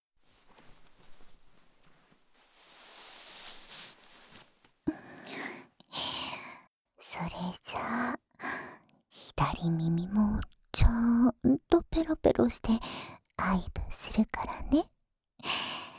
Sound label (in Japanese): 甘い囁きと吐息混じりの耳舐め（左耳）, ASMR